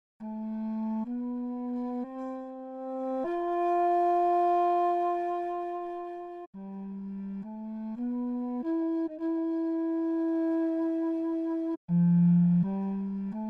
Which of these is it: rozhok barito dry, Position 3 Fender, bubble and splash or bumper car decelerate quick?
rozhok barito dry